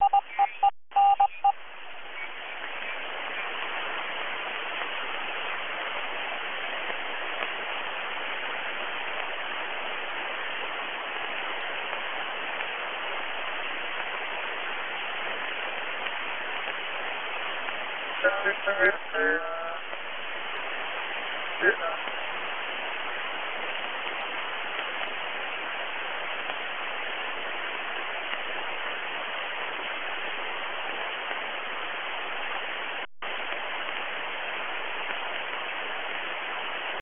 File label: Morse